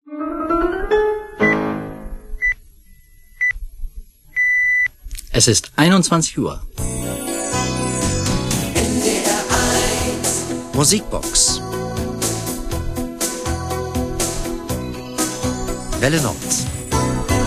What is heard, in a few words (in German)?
welle nord musikbox, jingles